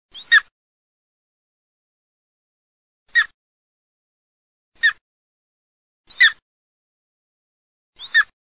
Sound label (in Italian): AQUILA REALE